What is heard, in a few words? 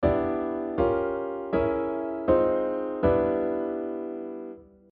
block chords